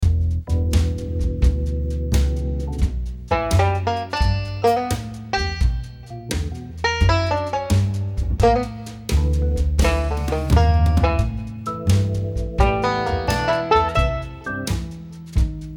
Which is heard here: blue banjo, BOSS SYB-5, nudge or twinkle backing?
blue banjo